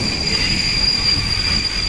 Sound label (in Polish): pisk